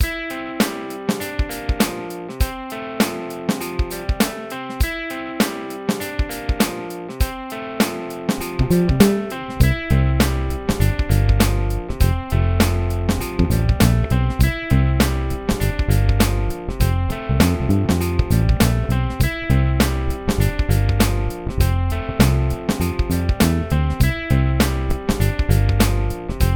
Motown

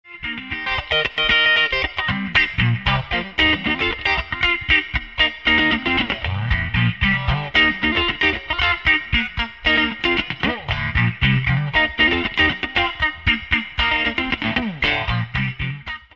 phase sample mono

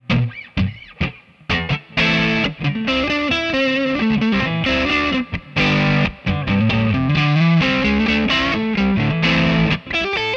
solo front vol10 tone9; G-Sleek-866

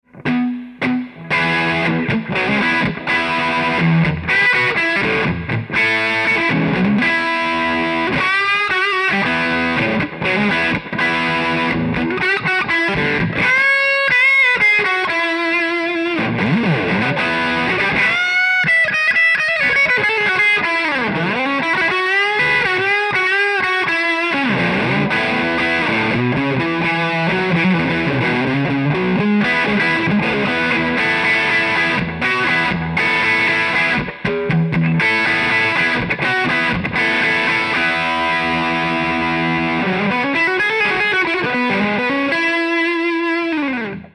hum

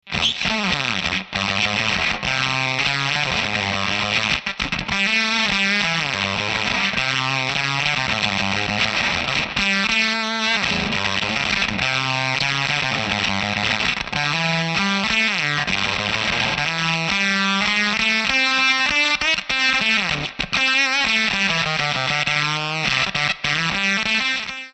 fuzz

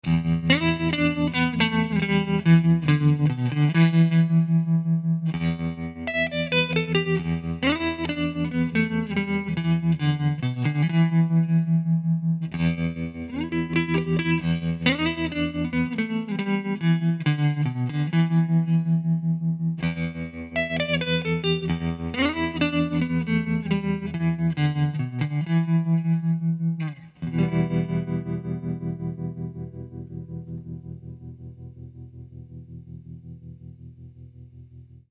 tremoro

